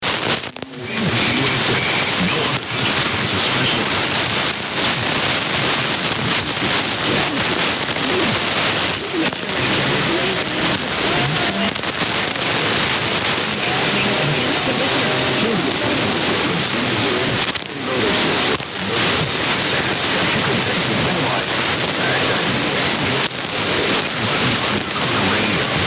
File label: TIS with mx underneath; 1710 Travis AFB (p)